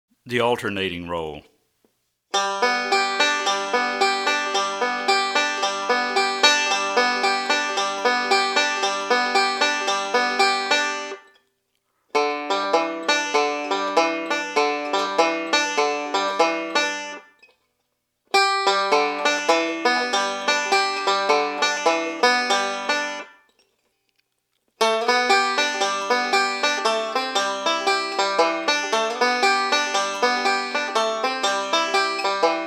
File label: Alternating Roll